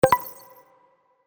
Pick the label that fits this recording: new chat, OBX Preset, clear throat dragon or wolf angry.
new chat